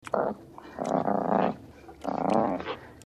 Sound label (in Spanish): sonido koala; mekanta